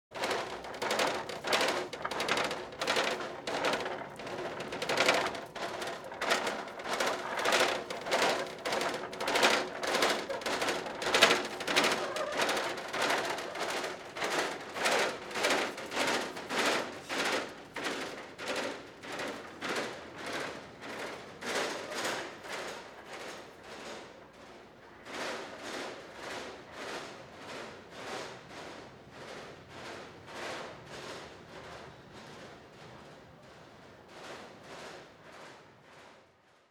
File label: hospital food cart; Asian Show Sound Effects